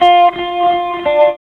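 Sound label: GUITAR TONE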